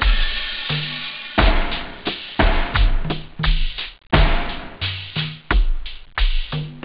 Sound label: dance, house; OctaMED SoundStudio V1